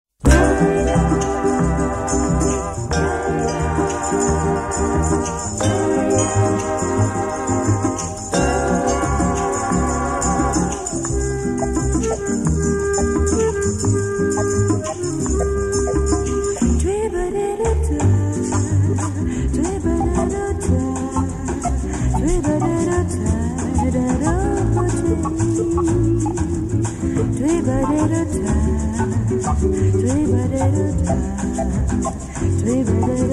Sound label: Bossa Nova